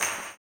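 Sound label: tambourine, 160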